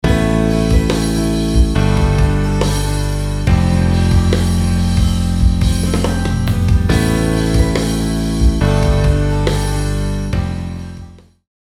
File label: Asus2 → Gsus2 → Fsus2